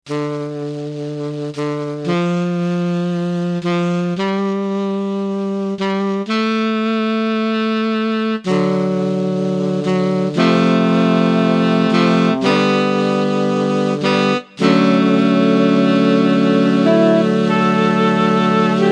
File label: tenor saxophone